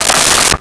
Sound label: Wood 2x4; Tools; 1001 Sound Effects